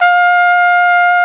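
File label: flugelhorn; Best Service; Alpine Volksmusik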